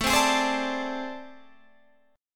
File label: A Minor Major 7th Double Flat 5th, down, Guitar, Standard; strummed; JGuitar